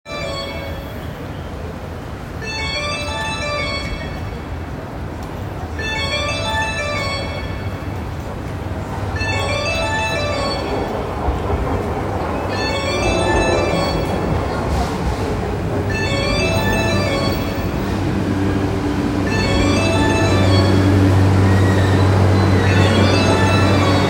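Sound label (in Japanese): JR山手線五反田 counter-clockwise 接近, train sounds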